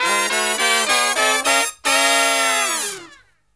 SLEEP, Killer Brass Riffs

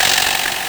TR727, Nintendo Gameboy LSDJ; 90 sSampleCDs; 300 Drum Machines

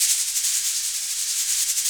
shaker, Africa; SHAKER FX; Spectrasonics; Heart of Africa 1

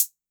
808 Hcl, Roland MV-8008; Roland MV8800

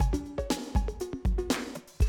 120, Classic Drum Loops

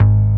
House, Dance Bass, Roland; Sound & Vision - Gigapack II CD 1

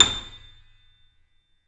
A 6, YC7 piano, mf; Roland L-CD701

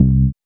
BAS, Dance Bass

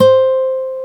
GTR Nylon, Nylon Martin; Club-50 - Foundations Roland

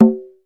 HI EDGE DRUM

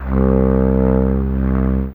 Saxz C3, Reeds; Fairlight CMI IIe

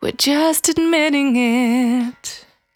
Cry 68bpm B, Vocal; Zero-G

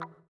VIBRA WAH, guitar; Spectrasonics; Bizarre Guitar

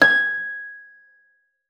FLAG G6, harp; Xsample VOL-8; HARP+FX

